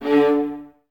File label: String Ensembles, Violas, VLS Detache; Miroslav Vitous